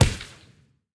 win Symbol Flash Add On; fairyhansel

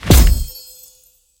near Big Win Reel Bounce; fairyhansel

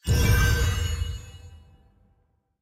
wild Near Win; wilderland

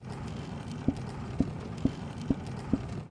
reel Spin; jack and beanstalk

